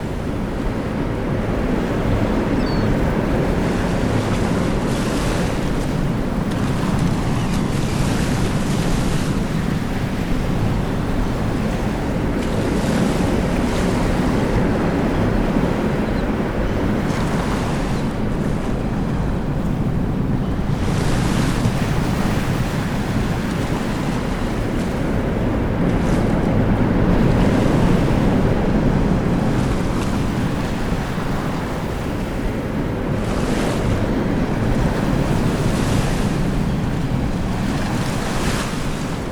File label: hangar ships wind; War Thunder